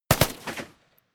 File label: rifle ak-47 exterior fire double; comedy-cartoon; Pistols and guns; pistolen-gewehre